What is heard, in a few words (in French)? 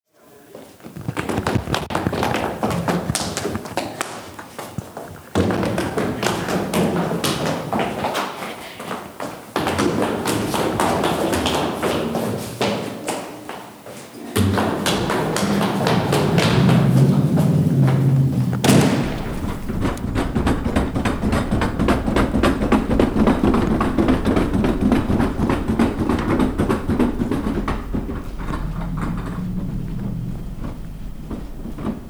bruits de couloir